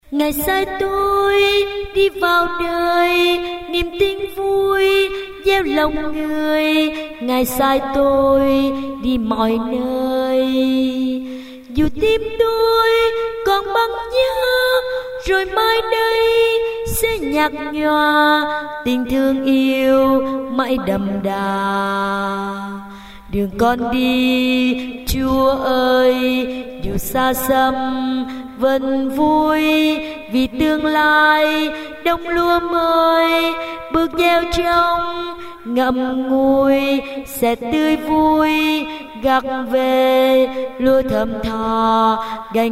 alto baso

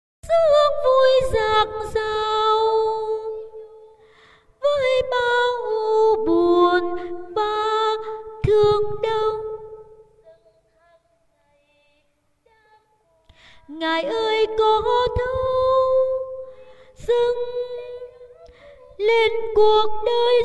tenor

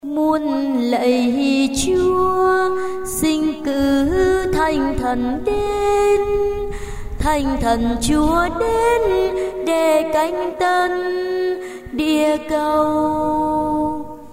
alto

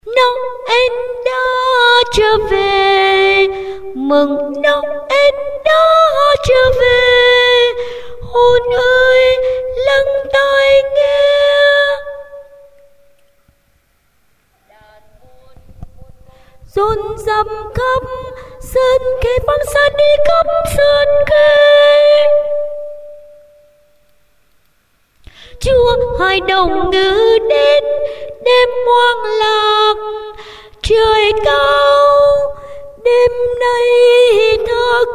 tenor

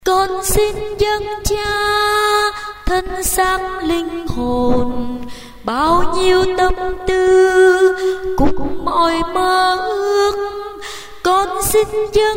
sop alto

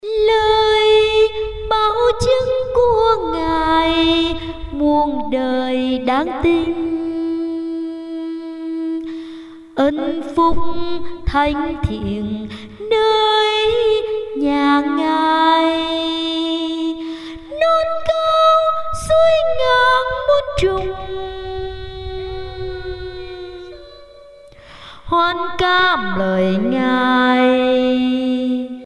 basso

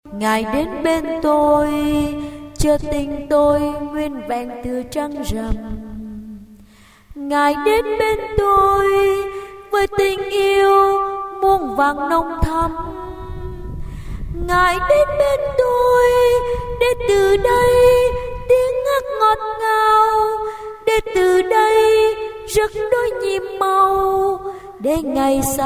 tenor basso

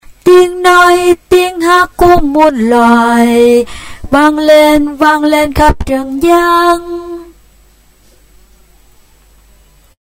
basso

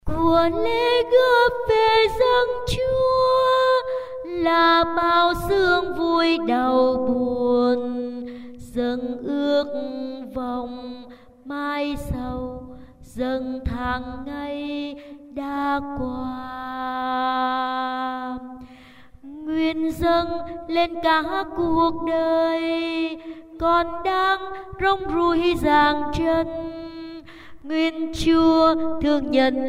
alto

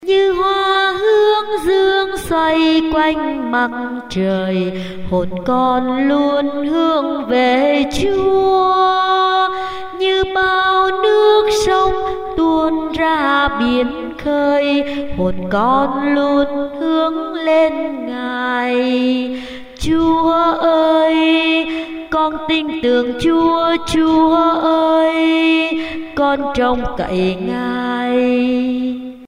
tenor basso

